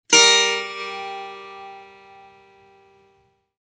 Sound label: setar si b